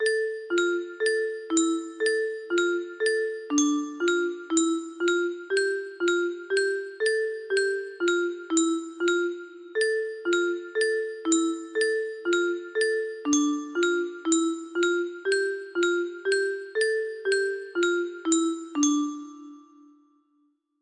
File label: horn dance, music box; abbots bromley